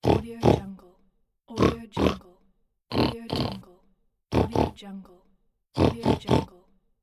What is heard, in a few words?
the cartoon pig